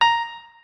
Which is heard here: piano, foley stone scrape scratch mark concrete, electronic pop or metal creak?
piano